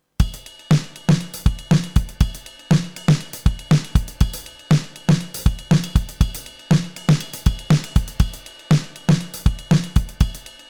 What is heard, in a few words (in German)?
Drums Dry ohne Console; VSL MIRPro3D